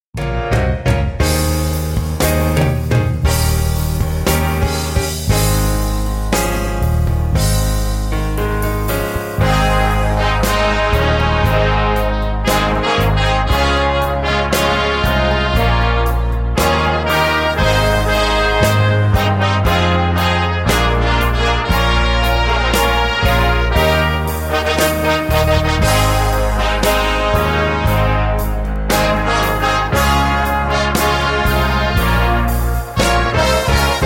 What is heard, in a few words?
Brass